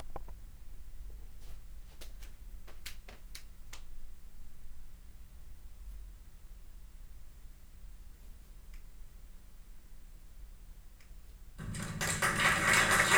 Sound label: 2 Room Test Pattern; Brooklyn; Home Setup